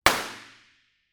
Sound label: gunshot interior